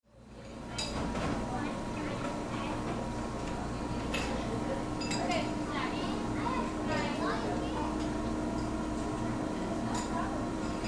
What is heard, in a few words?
Ambience Ice Cream Parlor People; Restaurants (Sound Effects:Backgrounds)